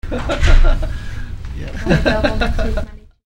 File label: laughs